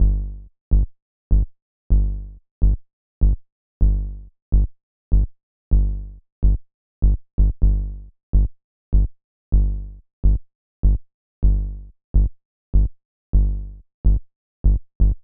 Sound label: punchy tech house bass rhythm - edm - gm - 126; loops